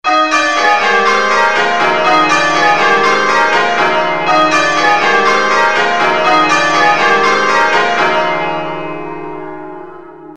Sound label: Hagdyke, changeringing